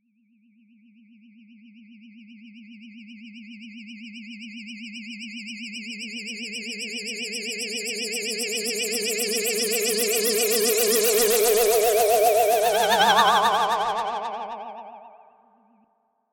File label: A Ghost Appears